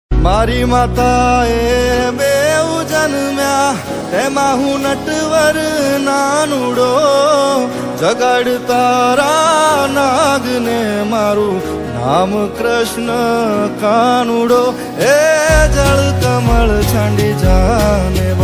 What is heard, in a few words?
Krishna Bhajan gujarati ringtone